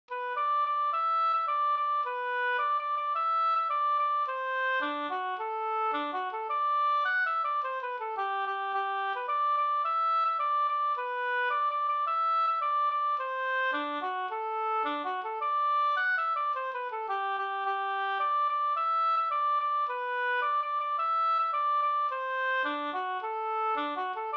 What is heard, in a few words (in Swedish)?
polka